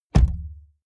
car bmw e46 interior door front close hard; Cars

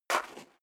step high heels gravel light single stair down; schritte; Footsteps

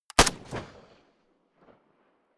rifle ak-47 exterior fire single close; comedy-cartoon; Pistols and guns; pistolen-gewehre

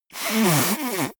nose blow

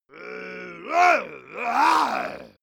monster male growl aggressive; kreaturen

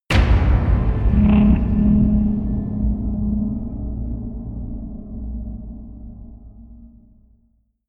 slight distortion, hits impacts; whooshes rises

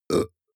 burp short; soundpool

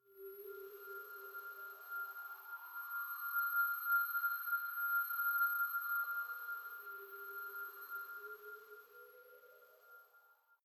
space ringing wind whales

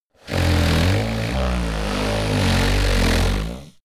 monster snore heavy low; comedy-cartoon; soundpool

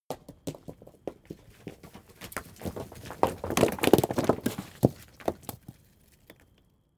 stone large fall long; natur-tiere; steine

